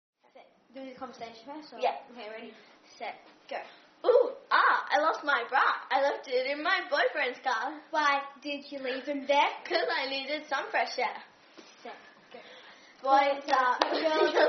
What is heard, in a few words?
Clapping